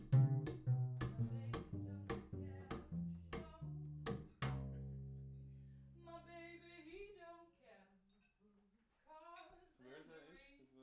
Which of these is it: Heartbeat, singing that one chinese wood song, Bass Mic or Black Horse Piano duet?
Bass Mic